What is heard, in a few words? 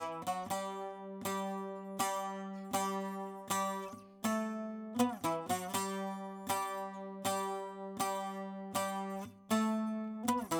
saz